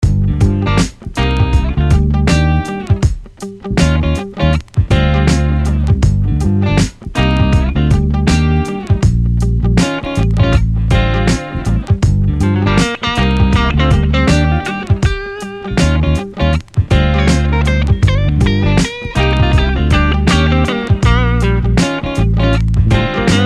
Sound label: Guitar and Bass Grooves